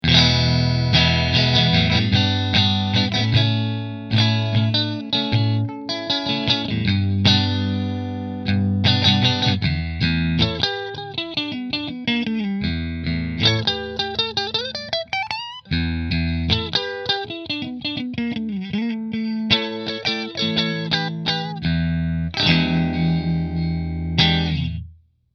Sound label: Position 3 Fender; Tonemonger